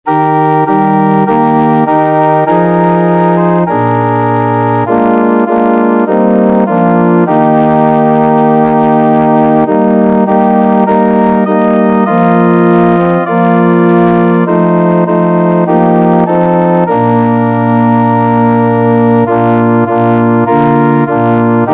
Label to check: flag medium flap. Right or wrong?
Wrong